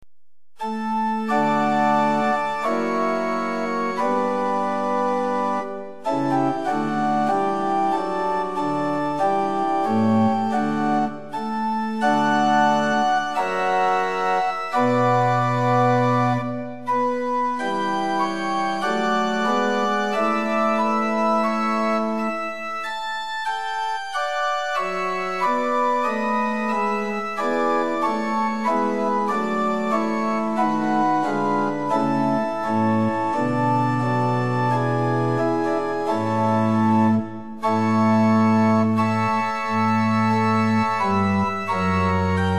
Liturgical Organ Pitch 440 Temperament Equal; Prinz 8 4; hymn

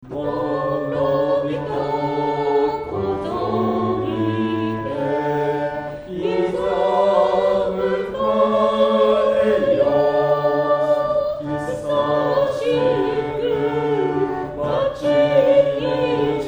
Choir practice

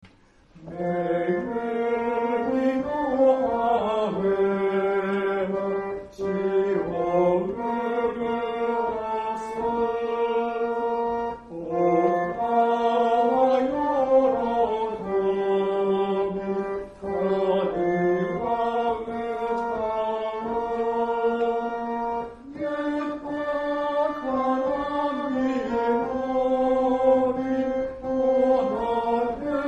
Choir practice; verse 2 TB

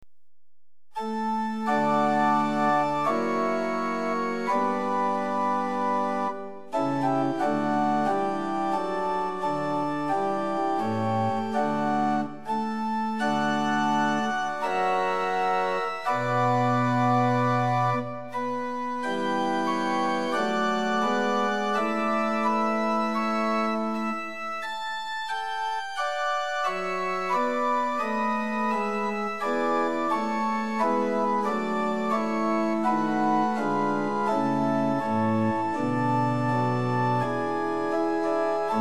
Liturgical Organ Pitch 440 Temperament Equal; Prinz 8 4; hymn

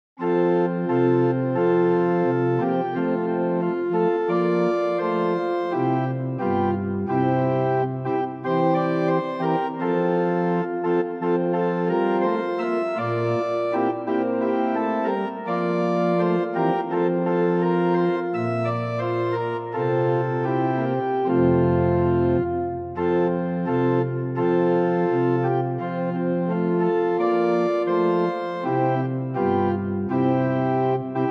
Organ; hymn

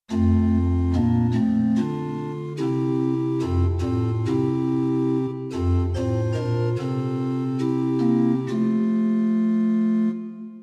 Pitch 440 Temperament Meantone; hymn

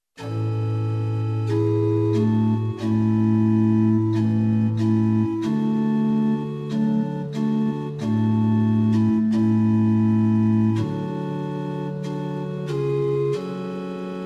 Pitch 440 Temperament Equal; hymn

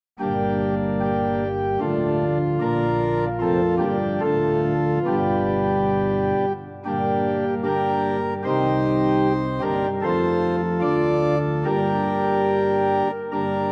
Organ Books, Pitch 440 Temperament Equal

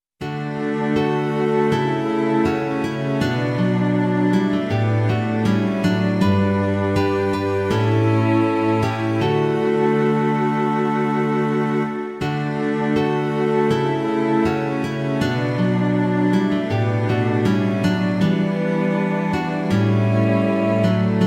Fl Str Str Str Gtr

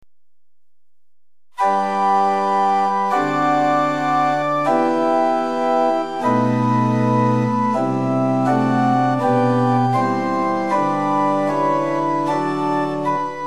Liturgical Organ Pitch 440 Temperament Equal; Prinz 8 4; hymn

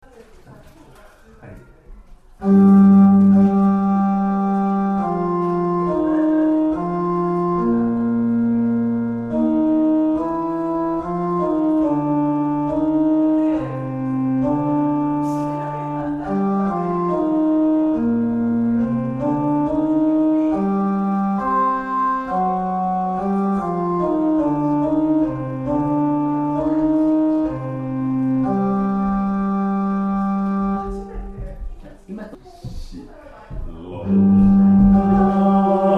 Chor practice Bass; S21-348 神の息よ; バスの音の確認：最初にオルガンのみ、次に歌とともに

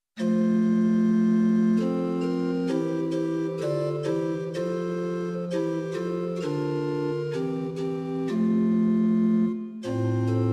Pitch 440 Temperament Meantone

